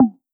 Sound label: Percs, Elektron MachineDrum SPS1 MKII